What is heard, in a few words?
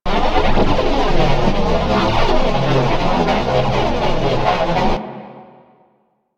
Space Pod Idle, Vehicles; Grineer; Warframe